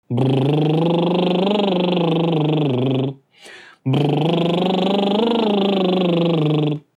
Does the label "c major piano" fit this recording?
No